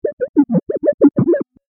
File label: Swipe And Wipe, whoosh